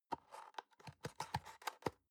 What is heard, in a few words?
box small close soft